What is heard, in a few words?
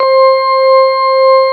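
CX3 organ C5 L, Keyboards Old School; USB Soundscan vol.09 - Keyboards Old School; AKAI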